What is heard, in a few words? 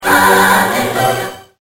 hallelujah